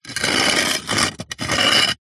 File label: foley brick slide on other brick short movement